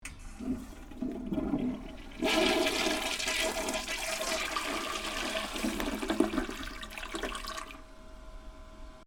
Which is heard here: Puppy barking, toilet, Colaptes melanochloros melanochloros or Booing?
toilet